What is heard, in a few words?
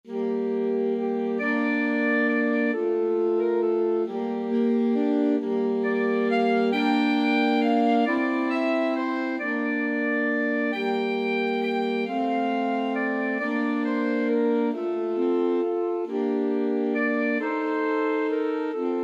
scarborough fair alto sax trio